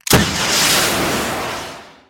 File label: cob launch; soundspvz